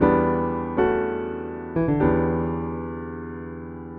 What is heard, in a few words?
Ac Piano 120 E, jazz keys, Chordy Loops, 120bpm